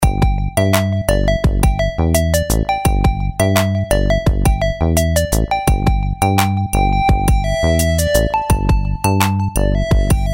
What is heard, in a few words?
Shuffle